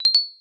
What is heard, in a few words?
ding up